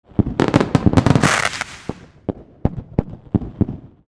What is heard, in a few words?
Fireworks display explosions short